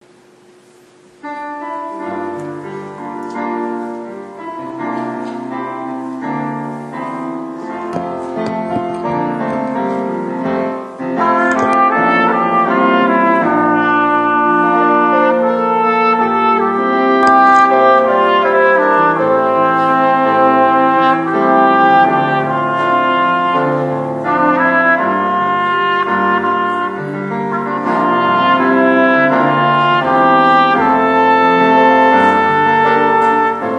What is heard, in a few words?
Trumpet